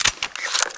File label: ak47 clip out, weapons; czero opforce